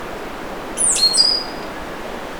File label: stitchbird